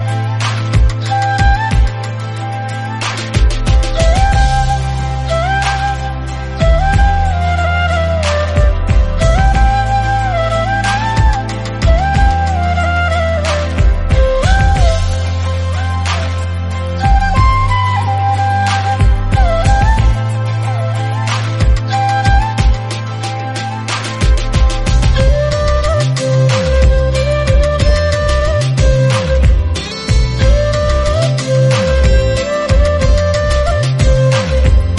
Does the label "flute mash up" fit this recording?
Yes